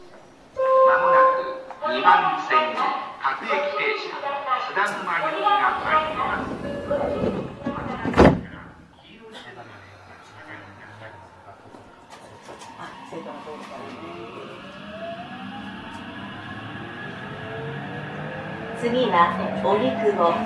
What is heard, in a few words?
vvvf, mitsubishi